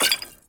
potion down; skyrim

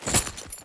armor up; skyrim

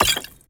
potion up; skyrim

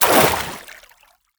water sprint; skyrim

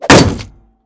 shield light bash

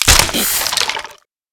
skeleton death

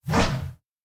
swing axe 2 hand

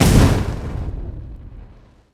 firebolt impact; skyrim

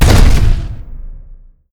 dwarvencenturion foot, walk

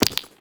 gem up; skyrim

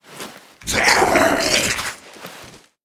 draugr combat idle taunt; npc

